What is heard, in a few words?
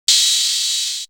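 Hi-hat; 90 sSampleCDs; Coldcut's Kleptomania VOL1; Drum One Shots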